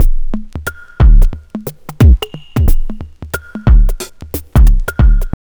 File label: TRIP 90, trip loop